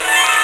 8 Bit Arpeggio; Mo' Phatt; Digital Sound Factory Mo' Phatt

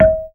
GAMBANG D#5, GAMELAN; Spectrasonics; Heart of Asia

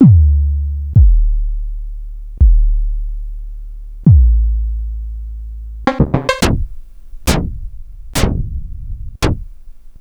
Rhodes Polaris

